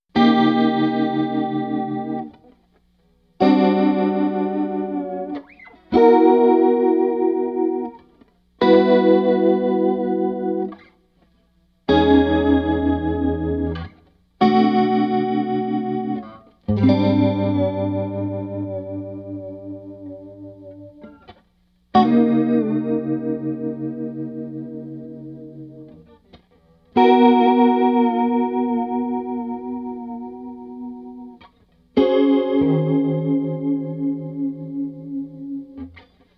WaterFall Chill

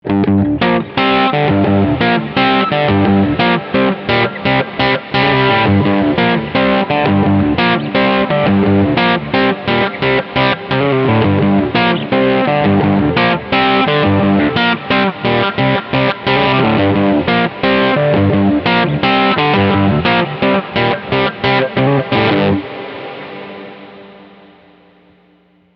cloud, Guitars; strymon big sky; reverb; Delayed Puffs

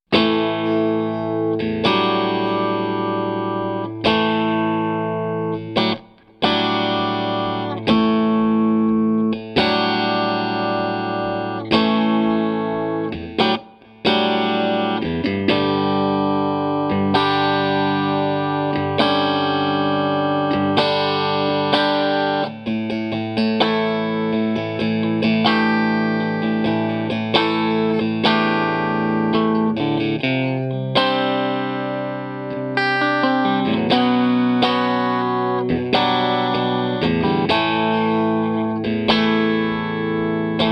strat; Dyna ssoR

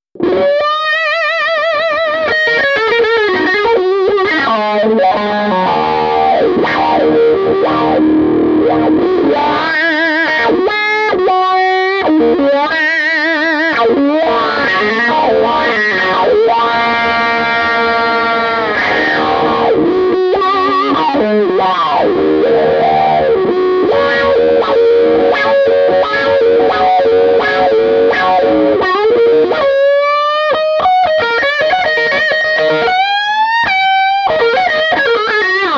Wah, guitars; TubeDreamer88; Wahcko

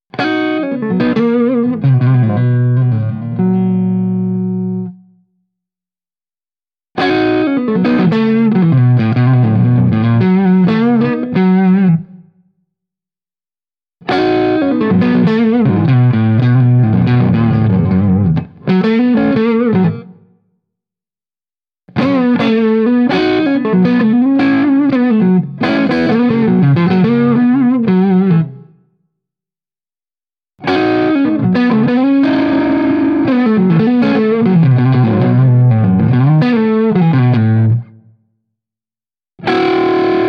Fuzz Phrase, guitars

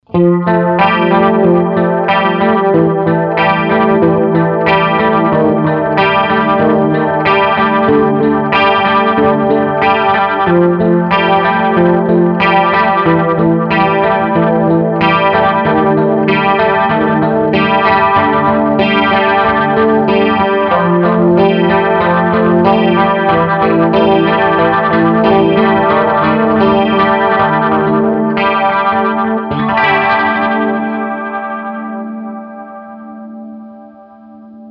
Delay WaterFall Ripple; Delay Llama

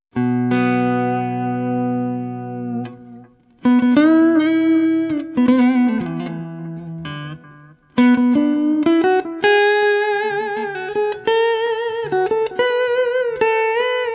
Delay Llama discreet

